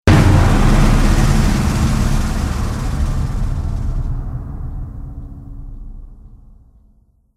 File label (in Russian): Trevozhny zvuk